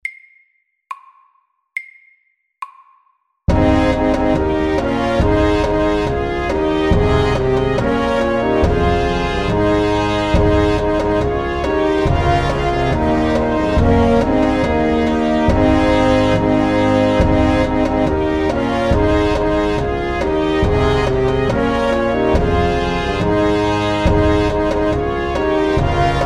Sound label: Ronde FAST 70